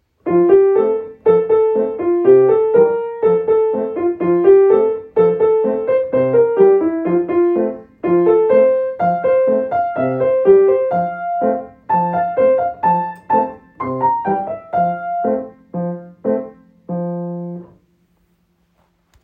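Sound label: Festive dance, Piano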